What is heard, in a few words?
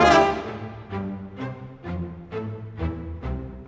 Orchestra, Rock Pop; Sonic Foundry (Sony Creative Software); Orchestral Series VOL-4 - Rock and Pop